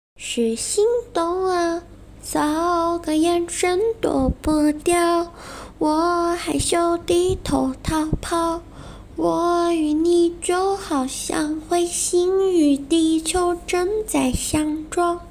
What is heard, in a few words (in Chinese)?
歌曲